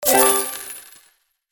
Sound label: Money notification chime purchase sale